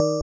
BELL

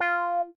F5 meow

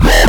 dubstep; osu

